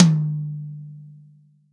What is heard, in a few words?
TOM, Real Drum Kit, POP KIT